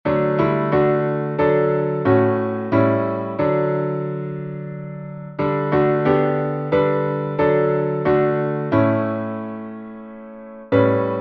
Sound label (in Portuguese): salmo instrumental